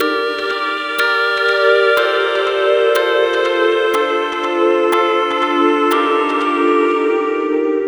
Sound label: Synth Pattern; Electrocution